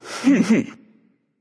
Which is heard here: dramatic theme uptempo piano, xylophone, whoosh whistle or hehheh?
hehheh